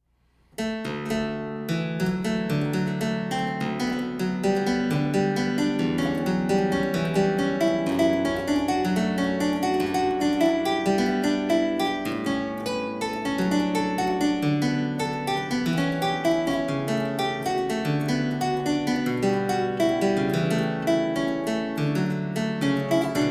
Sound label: French Suite No. 4 in E flat major, Allemande; Johann Sebastian Bach